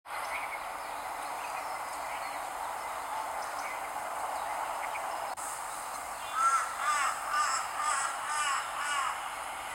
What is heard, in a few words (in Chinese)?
巨嘴鴉